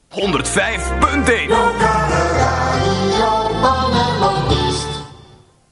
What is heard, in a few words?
jingle; Palermo